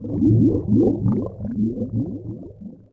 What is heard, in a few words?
bubbles; DAoC; Toa